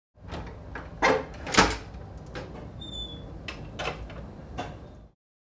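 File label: doors metal hatch on ship opening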